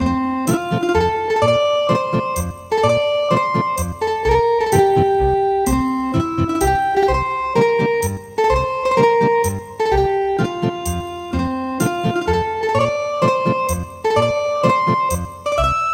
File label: Ethnic Guitar